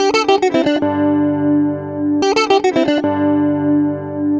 Ac 12 Str Gtr 109bpm G, Twelve String Guitar, African Guitars; Quazibeat; African Secret